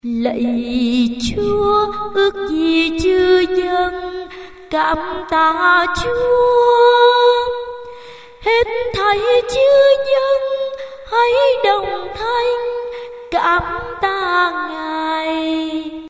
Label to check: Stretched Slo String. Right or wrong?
Wrong